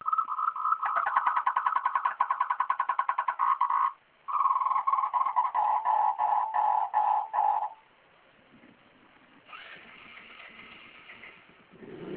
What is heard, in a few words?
frog